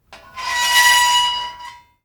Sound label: random kitchen; devour